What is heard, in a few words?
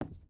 footstep boots wood walk; Rust